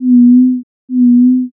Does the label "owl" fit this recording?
Yes